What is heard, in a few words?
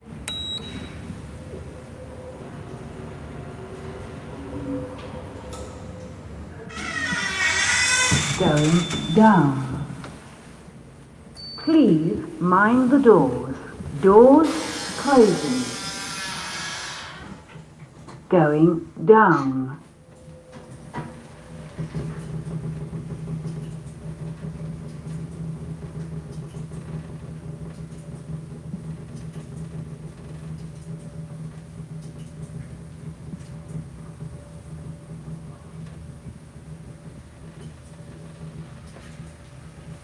int manchester lift ride voice, uk big city interiors; Sonniss GDC